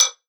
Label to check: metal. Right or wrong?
Right